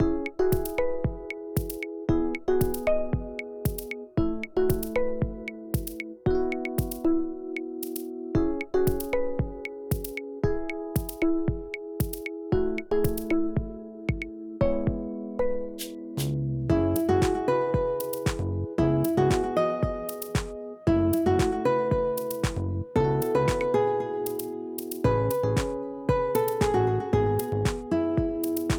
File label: Bashment, Aircheck, Retro